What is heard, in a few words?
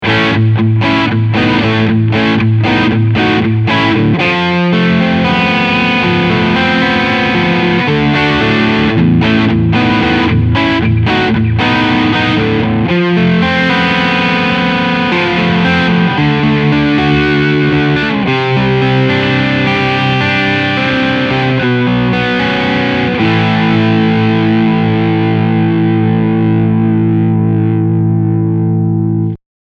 Overdrive Bass High FULL